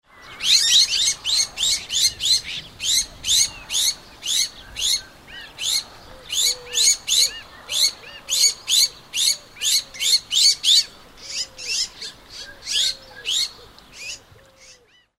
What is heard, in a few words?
iberian magpie